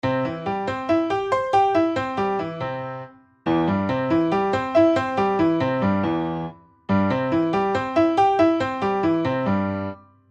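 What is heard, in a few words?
Long arpeggios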